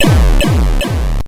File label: death; Arkanoid